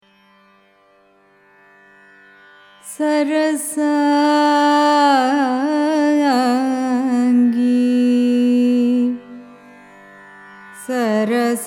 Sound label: raga sarasangi signature, carnatic music